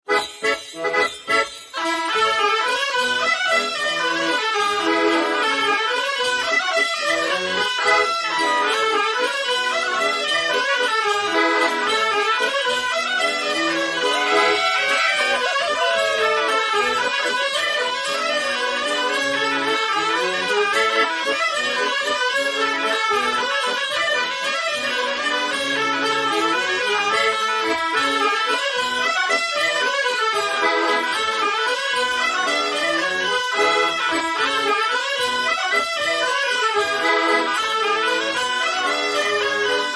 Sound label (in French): Accordeon